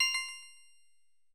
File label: zing